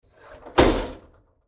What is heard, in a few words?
Household Closet Double Metal Doors Right Side Slide Open Hard; Closet Door Sound Effects; Blastwave